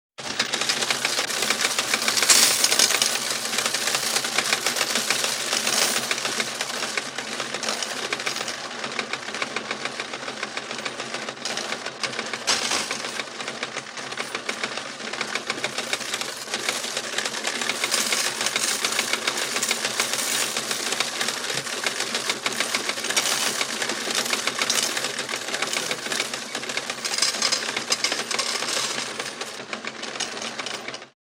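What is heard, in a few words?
coin sorter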